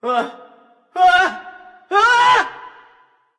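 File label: non verbal fear, voice; cernunnos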